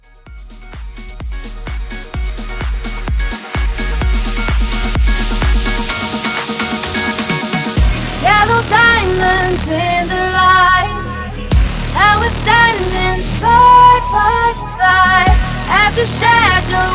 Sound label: House Mashup 128 CLEAN